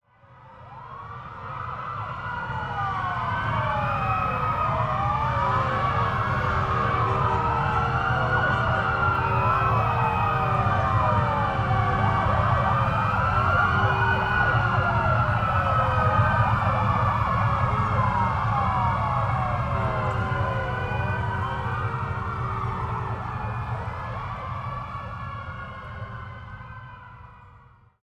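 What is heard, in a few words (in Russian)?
уличная скорая помощь шумная обстановка конец света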